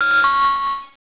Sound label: Alert